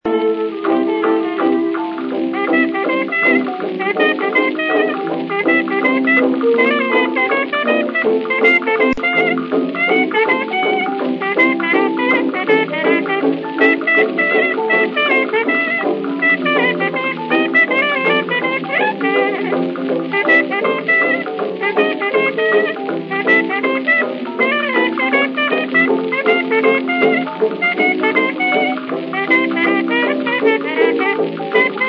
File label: babychimes